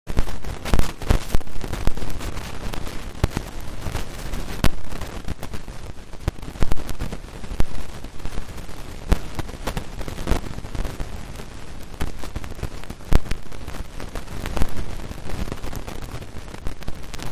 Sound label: riverside; Kyoto U Ashiu riverside